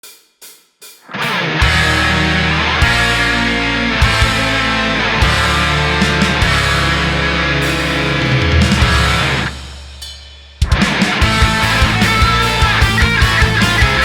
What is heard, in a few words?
Metal Cover